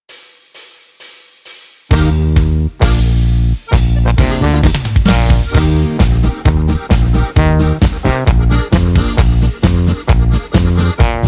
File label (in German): Polka; F B ES AS